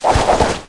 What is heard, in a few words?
S U B2 S F X Rolling